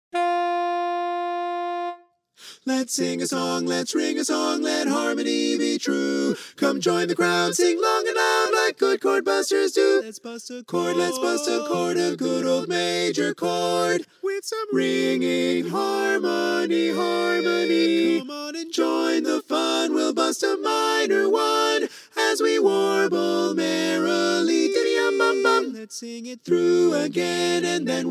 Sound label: ssaa bari